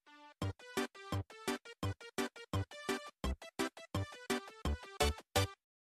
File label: 8-bit; Mickey